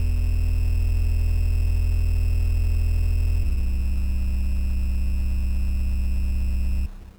Horror Bass

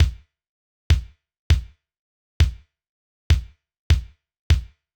normal Kick; Orange Oren; Sprunki Incredibox

